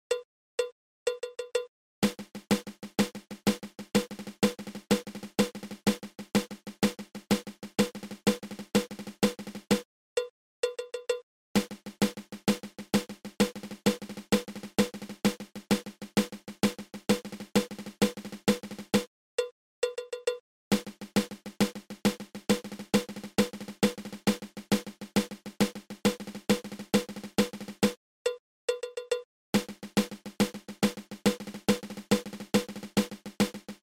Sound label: Single Drag Tap